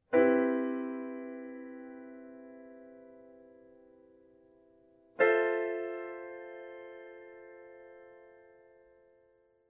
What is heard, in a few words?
Major Sevenths